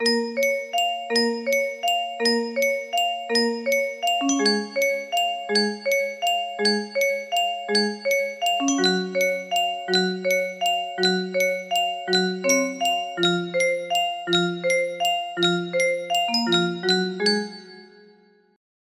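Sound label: pling pling pling, music box melody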